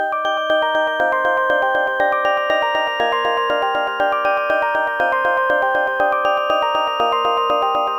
光のオルゴール; ゆめ2っき

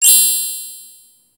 キラリーン♪

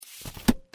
kasa hiraku

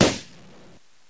風船ポップ

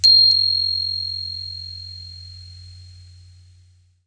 hurin

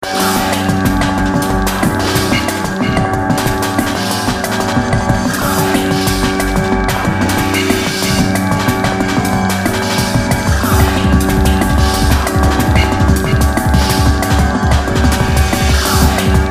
ループ「空中飛行」 ほうき・乗り物等のエフェクト時; By音師竹内; ゆめ2っき

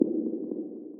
足音

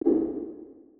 足音

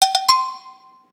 コミカル ボケ

